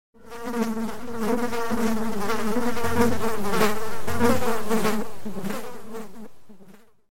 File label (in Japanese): ハエが飛び回る音